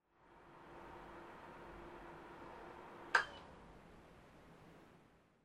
PC Operating Noise Far Forced Shutdown

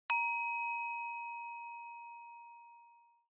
Ding Low Long; Onoma

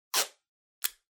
Sellotape Pull Rip

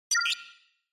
Cyber

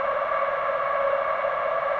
Soccer Cheer Loop; SNES

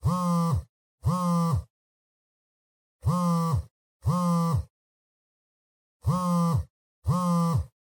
Cell Phone Vibration Cushion

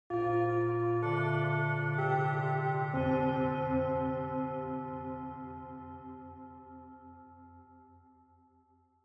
Japanese School Bell Far Short

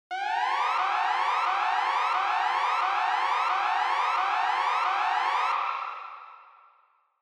Warning Siren, Reverb High Long

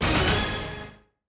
Quiz Question

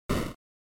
NES General Noise Single

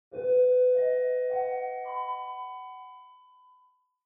Chime Announce

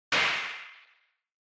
Impact Dry; Onoma

